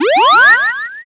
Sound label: charge